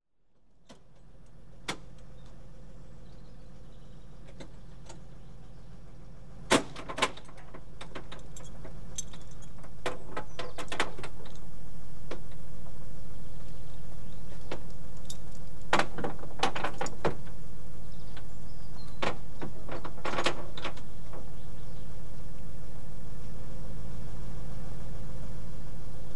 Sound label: Refuse Skip - Skip loaded onto lorry; Industry; The BBC Sound Effects Library